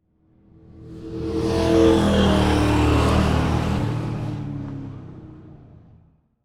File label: Race Car Drive By 180D; transportation